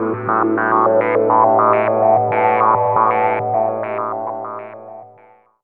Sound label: DR. LOOP, TRANCE; X-Static Goldmine 3